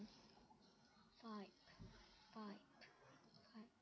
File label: pipe